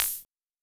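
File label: Hi-Hat Open, Luxor Passet; EKO Rhythm Box; Drums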